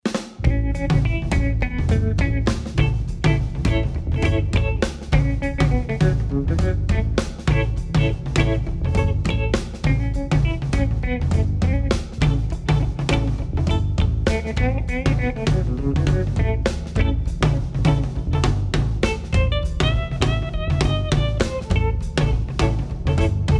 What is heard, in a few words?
Style Blues Drumming